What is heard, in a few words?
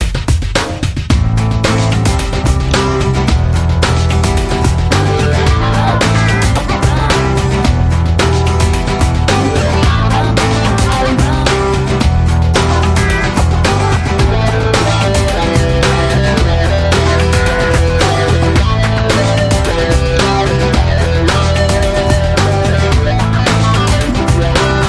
Acoustica